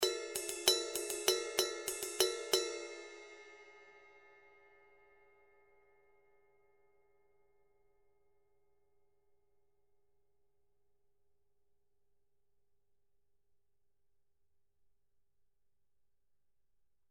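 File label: ride, ZXT 20 CTR; ZDJ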